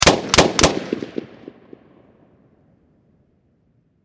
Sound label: Warfare Machine Gun M16 Triple Shot Close Perspective